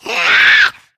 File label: warn, voice; video game; source engine; left 4 dead 2; spitter